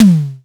tom, Tama TS-305

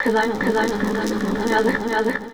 Fx Vox; Equipped Music; Loungin House

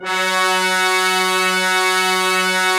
BRASS F#4, STEREO BRASS; Greytsounds VOL-1

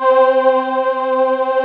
ANA CHOIR; STRING+CHOIR; Pro-Rec; Synthsonic

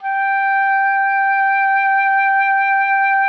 OBOE G5; Xsample VOL-6

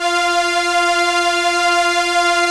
STR ARP, ARP Strings, ARP Slow Str; Roland LCDP09 Keys of the 60s and 70s 1

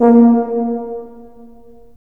BRS TU; Roland - LA Composer vol. 3

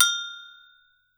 AGOGO HI, Steel Percussion; E-MU Producer Series Vol. 7 – Old World Instruments

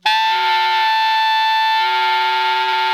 ALTO GR A 4, Saxophones; Greytsounds; Philip Wolfe David Crozier's Saxophones

